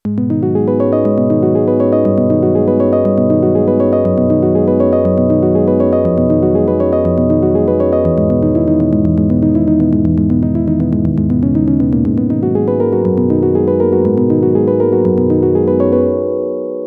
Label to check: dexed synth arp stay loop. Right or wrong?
Right